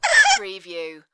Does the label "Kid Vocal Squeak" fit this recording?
Yes